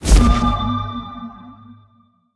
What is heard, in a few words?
resurrect; smite; khepri